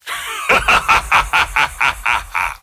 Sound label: laugh